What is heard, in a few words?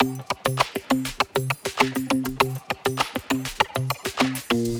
pick n click music loop; netent